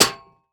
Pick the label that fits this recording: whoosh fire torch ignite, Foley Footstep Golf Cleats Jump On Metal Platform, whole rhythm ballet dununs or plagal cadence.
Foley Footstep Golf Cleats Jump On Metal Platform